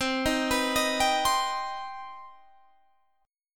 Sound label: C Minor Major 7th C Guitar Open G